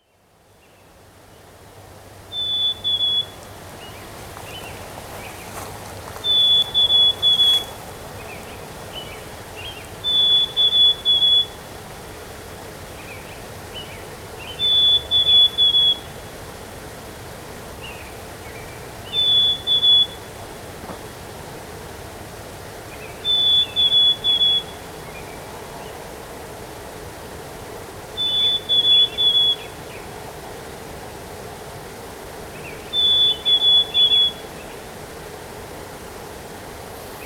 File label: bird song